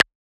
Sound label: Percussion; FLPRO